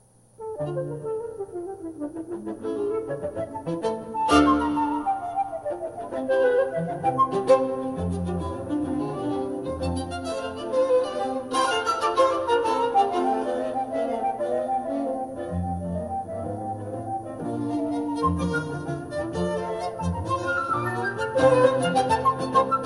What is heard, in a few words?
flute qnt; molique